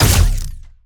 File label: Gunauto Plasmid Machinegun Single